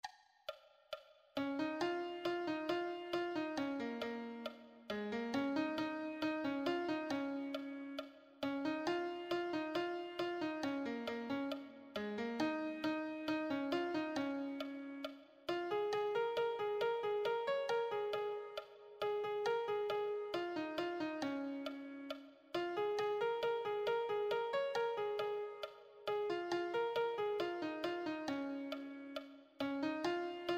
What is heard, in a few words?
lead, piano